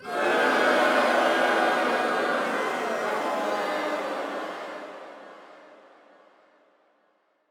Boo Crowd; Lunaro

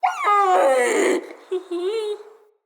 Wolf Cub Trapped Call Far; Vocals; Animals

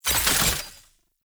Incarnon Wrist Gun Transform, Weapons; Warframe; Tenno

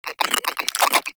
Vocal Idle, Critters; Characters; Warframe; Cryptilex; Hunting Critters

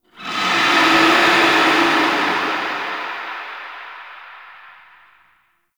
Attack Verb; Enemies; Warframe; Tau; Sentient Dactolyst

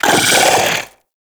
Arachnoid Wraith Vocal Attack Short; Warframe; Corpus

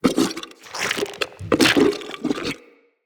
Shrimp Idle, Creatures; Vocals; Baliq Shrimp Glade

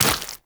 Land Flesh, Footsteps; Warframe; Tenno

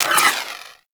Wall Hand Slide; Footsteps; WallRun; Warframe; Characters; Tenno

